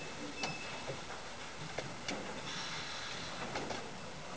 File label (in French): Machines Son